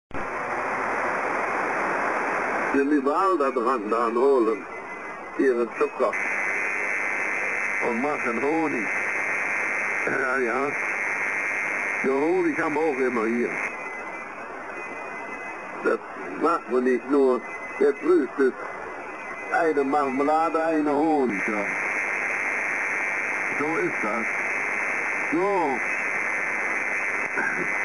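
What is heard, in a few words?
80 directivity local qrm reduction; 80 meters directivity and local qrm reduction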